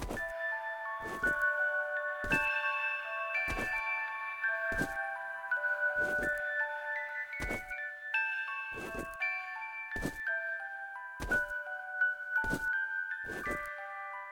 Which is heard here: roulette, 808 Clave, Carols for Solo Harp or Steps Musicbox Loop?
Steps Musicbox Loop